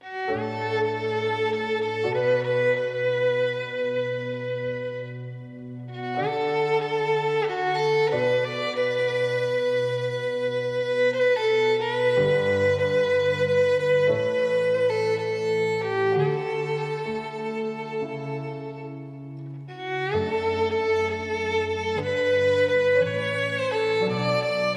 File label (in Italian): violino piano